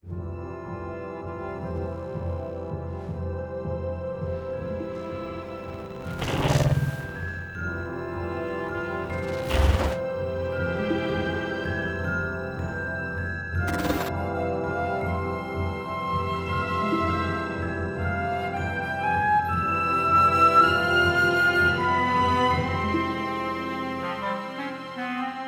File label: Ident SFX; lego the incredibles 2018 pc